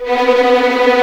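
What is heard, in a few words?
B3 tremolo, String; East West; The Ultimate String Collection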